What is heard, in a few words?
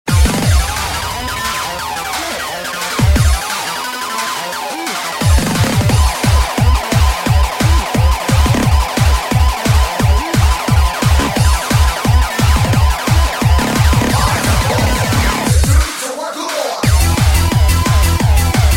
techno police